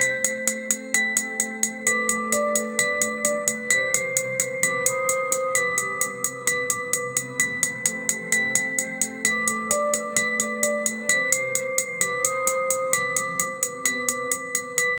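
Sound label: dark atmospheric background, magical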